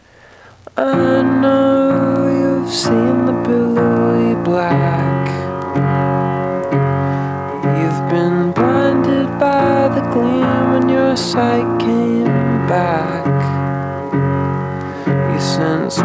piano

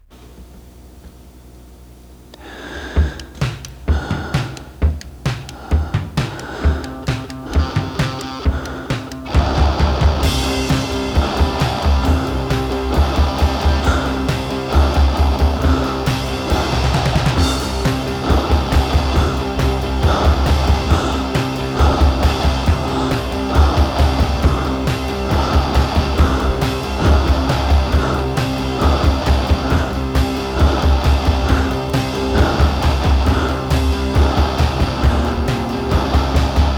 instrumental

